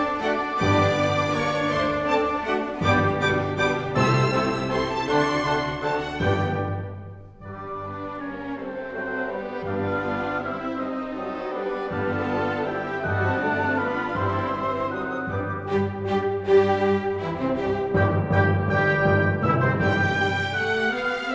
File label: classical